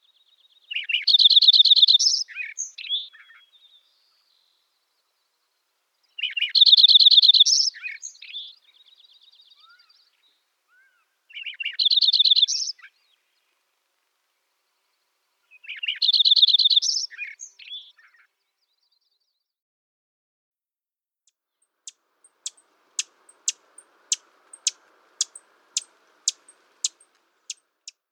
Lincoln's Sparrow

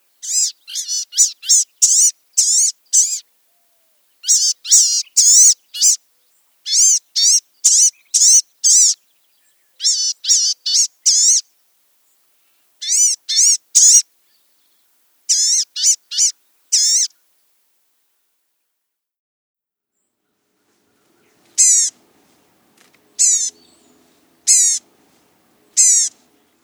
Blue-gray Gnatcatcher

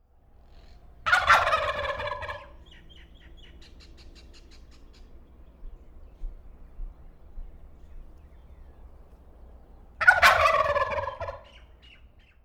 Wild Turkey

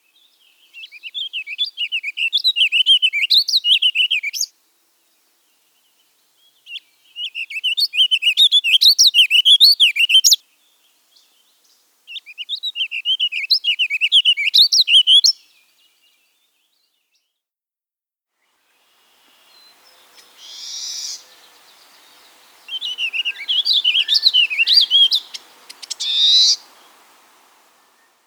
Warbling Vireo (Eastern)

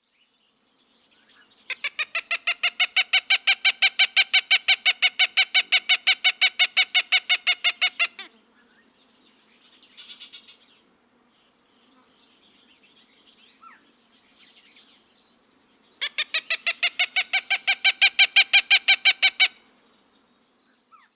Cooper's Hawk

